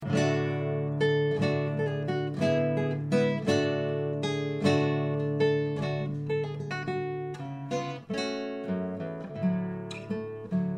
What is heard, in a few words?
classical guitar; monrad; nylon-string guitars